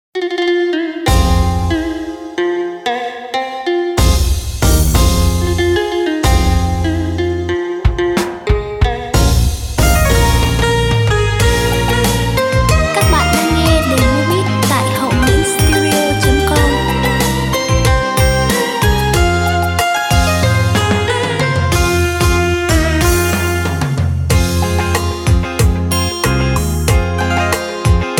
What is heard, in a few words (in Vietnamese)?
beat